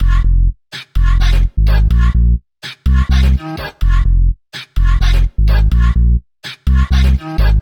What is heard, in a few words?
126 F COMBI GROOVE, funky; Loopmasters; Funky Underground Vol 2; COMBI GROOVES